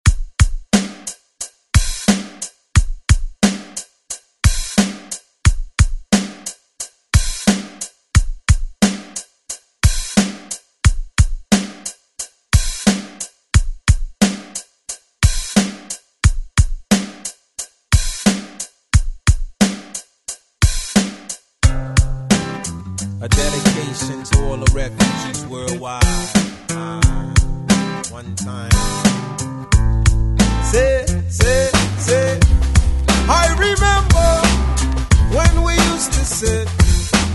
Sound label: 90s ReDrum; DJ Mhark